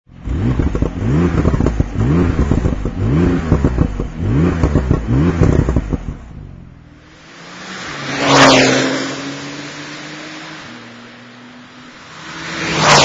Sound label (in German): Porsche 911 Turbo 911 Turbo S Auspuffanlage; TECHART